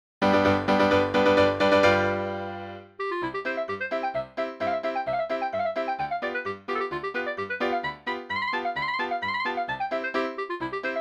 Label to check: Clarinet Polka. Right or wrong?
Right